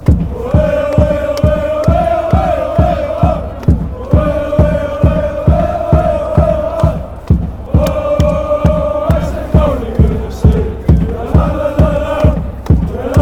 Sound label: oh forza, chants; groningen fc